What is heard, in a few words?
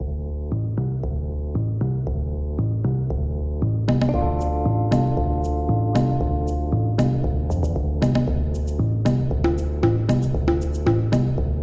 Temple Groove; Junk Jack; Yuca